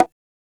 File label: Perc; Donkey Kong